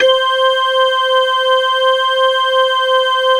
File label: WICKED C4, WICKED ORGAN; Best Service Alpha Dance vol.2